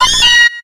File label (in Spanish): Grito de Meowth; Actualizando grito en la sexta generación (XY)